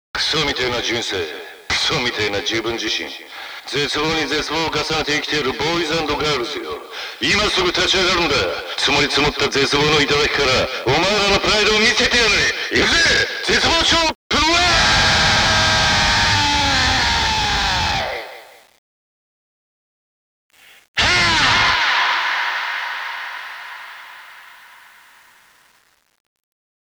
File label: karaoke bgm